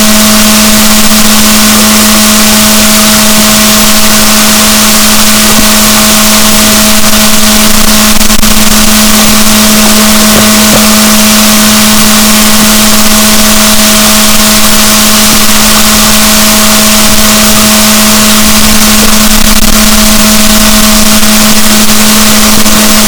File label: rorquals negatif, boat